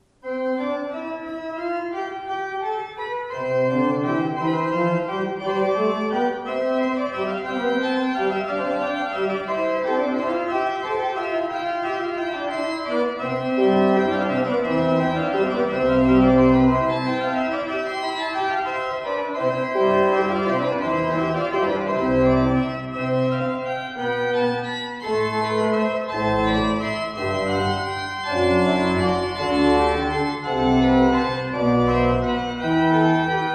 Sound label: Simon